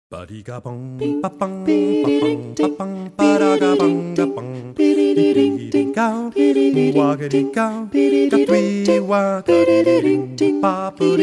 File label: bossa